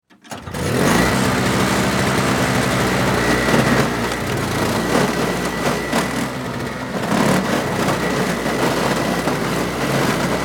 Industry Snow Blower Pull Start Clean Driveway Turn Off